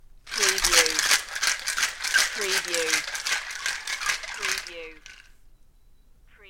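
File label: Ice Shaker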